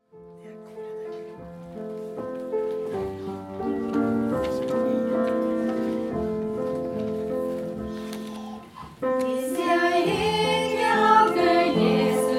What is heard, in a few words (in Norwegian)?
Musikklaget, Sanger